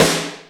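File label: R8-SD, snare drum; X-Static Goldmine 1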